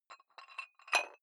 dumbbell put on rattling big weight soft, fitnessstudio